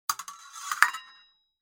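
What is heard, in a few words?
another sound of knives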